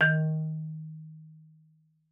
marimba D#3; Xsample VOL-4

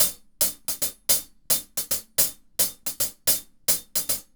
Hi-Hat Groove

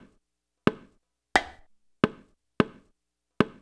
loop, percussion; InVision Interactive; Percussion Slam; KWICKIE

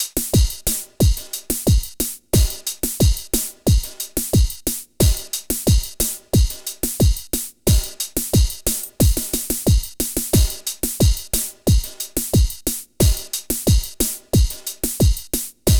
hiphop; INIS; Funky Xtreams

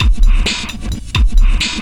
Beat, Drums, Eclectic Electronica

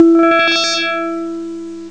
pss-170 special; Amiga Soundtracker Sample Packs

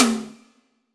tom, drums; Yamaha SY85

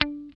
GUI D4, E-GUI MUTED; Sound & Vision; Gigapack I CD 2

